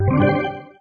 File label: sfx bonus; LG GW820 eXpo